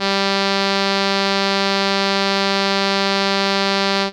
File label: Trumpet G3, ARP Solina Unmodulated; musicradar-synth-samples